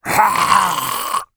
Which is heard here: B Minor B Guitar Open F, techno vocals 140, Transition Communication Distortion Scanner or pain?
pain